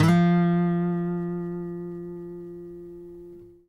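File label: trill, Guitar; Quantum Leap; Guitar & Bass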